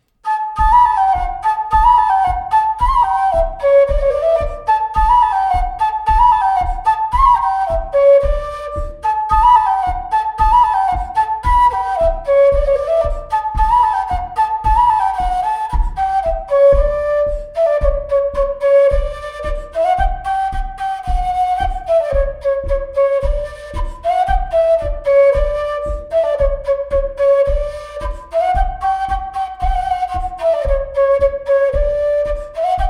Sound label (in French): Bourree